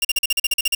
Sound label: error; TeraCopy Pro 3.26